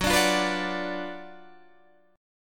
F sharp Major 7th Suspended 4th Sharp 5th, F sharp, down, Guitar, Standard; F#M7sus4#5 strummed